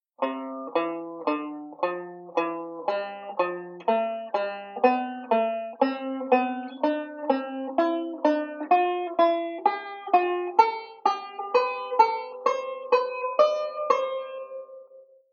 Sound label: Broken 3rds, chord melody; Beyond Chord Melody Volume II